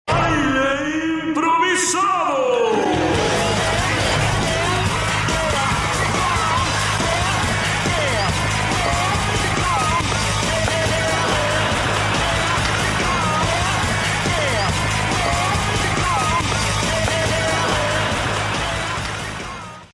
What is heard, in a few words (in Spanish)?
baile improvisado